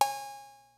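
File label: HIPHOP KIT, DRUMS; Kid Nepro; Elite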